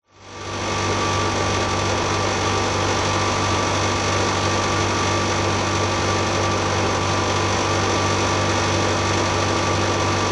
industrial air conditioning unit buzz operate contact mics